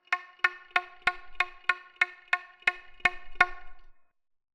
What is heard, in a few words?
mystery room